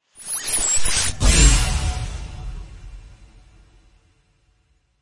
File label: Impacts Abstract Explosion; Gothic Storm Music; Advanced Sound Design 2012